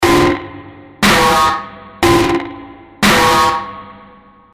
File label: scorpion sting